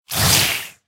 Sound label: Fight Vampire; mighty party 2017 gamerip pc ios android